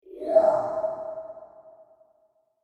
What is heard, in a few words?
Whoosh Transition Materialize Airy Vocal Element Reverb; Whooshes; Sound Design